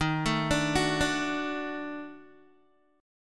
Eb Minor Major 7th Flat 5th Eb down Guitar; EbmM7b5; arpeggiated; JGuitar

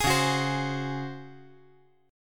Db Major 7th Suspended 4th Db down; strummed; JGuitar

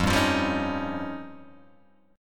F 7th Suspended 2nd Sharp 5th, Guitar, Open G

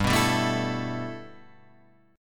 G 6th Add 9th G Guitar Standard, chord; G6add9 strummed; JGuitar

